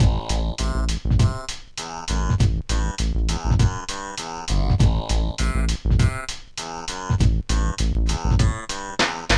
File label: Huawei Alarm Clock Ringtone